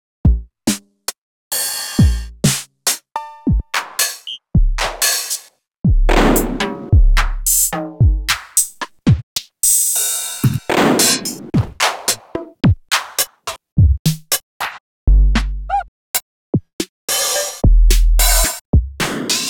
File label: sequencer